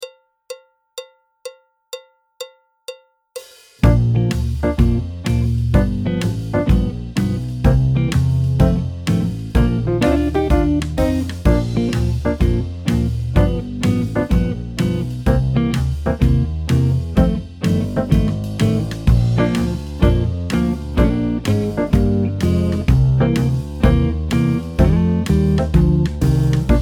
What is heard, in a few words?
Dandified BT (alto, tenor, trumpet); Jazz Syllabus Level 4